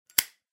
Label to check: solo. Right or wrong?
Wrong